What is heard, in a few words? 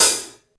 CYMB